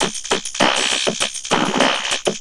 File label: Vocobeat; Sonic Foundry (Sony Creative Software)